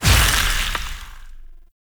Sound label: Incarnon Void Release Heavy; Weapons; Warframe; Duviri; Incarnon Adapters